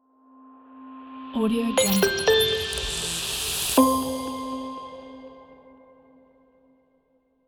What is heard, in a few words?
commercial logo